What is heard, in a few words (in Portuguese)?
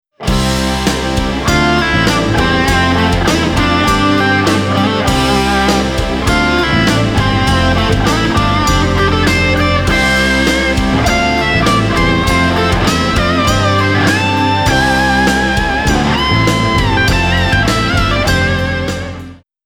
Blue Tone Solo do Riff, guitar